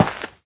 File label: footstep gravel; 30 Days to Survive